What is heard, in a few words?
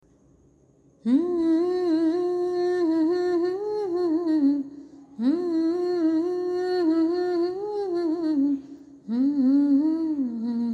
Humming